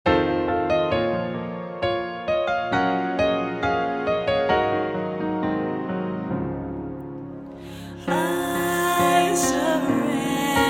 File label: Ten, choir; Presence op het Malieveld